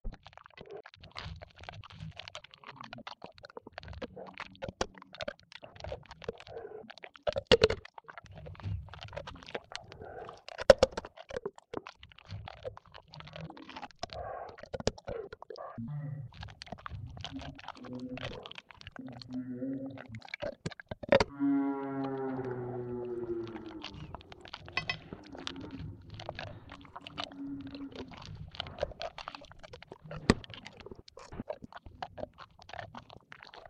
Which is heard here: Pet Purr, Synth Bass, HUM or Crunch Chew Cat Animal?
Crunch Chew Cat Animal